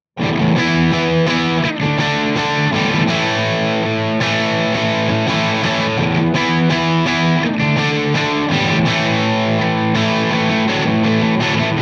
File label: crunch; C12K-2